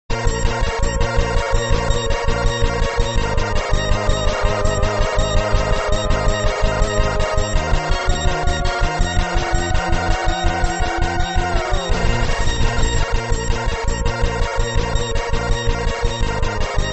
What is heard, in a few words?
Live Theremin Mix